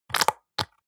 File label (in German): soap dispenser press squish, badezimmer